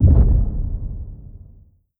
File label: step lfe, strider; creatures; Half Life Model Pack